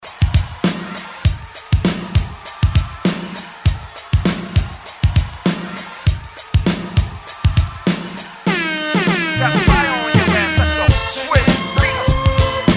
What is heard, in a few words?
Re Drum 100Bpm